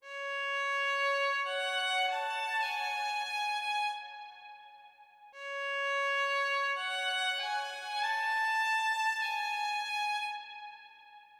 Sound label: strings lonesome, Sad Loops